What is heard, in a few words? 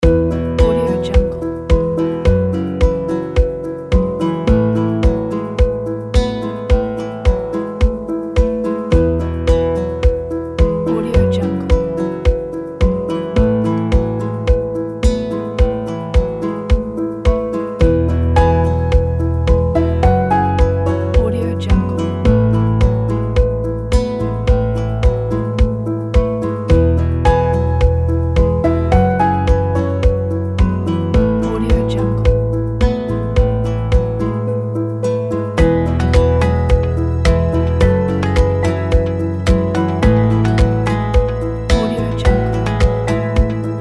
acoustic folk loop; ulb production